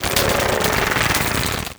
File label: extra electrics submerge